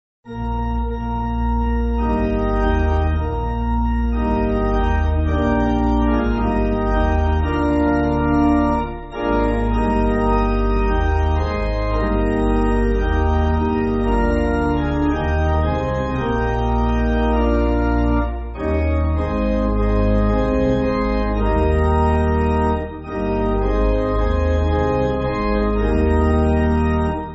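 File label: Pipe Organ, Backing Files for Churches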